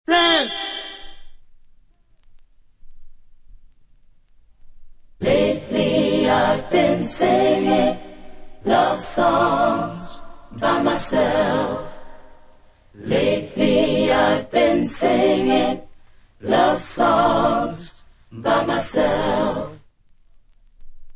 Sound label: acappella